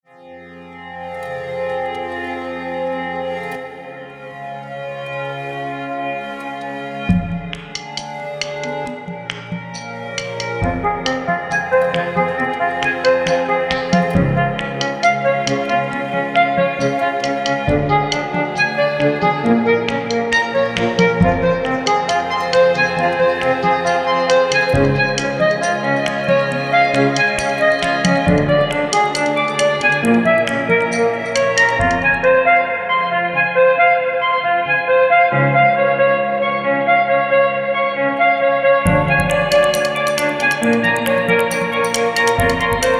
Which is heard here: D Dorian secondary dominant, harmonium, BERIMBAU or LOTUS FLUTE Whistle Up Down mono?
harmonium